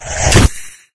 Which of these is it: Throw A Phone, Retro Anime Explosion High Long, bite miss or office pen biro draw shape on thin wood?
bite miss